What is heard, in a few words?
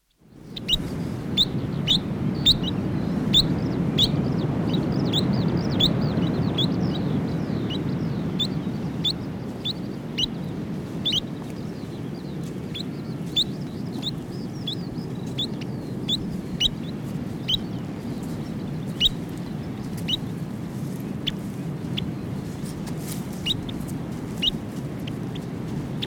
nz dotterel song; conservation, native animals, birds